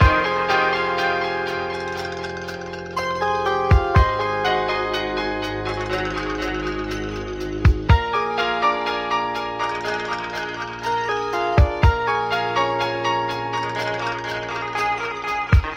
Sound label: keys, phonky loops